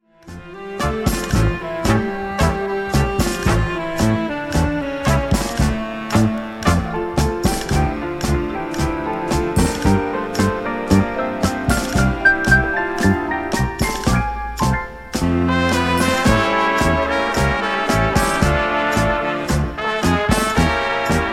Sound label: Tango